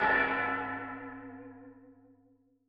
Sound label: continue button to main; netent